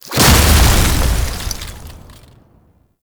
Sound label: Snow Globe Spawn; Warframe; Nineteeen Ninety Nine; Deep Archimedia